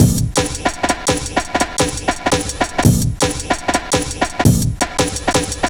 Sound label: Old School, Jungle, Drum Loops 168.5; Zero-G Jungle Warfare 1, 2 & 3